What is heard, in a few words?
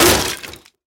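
kick on a wooden structure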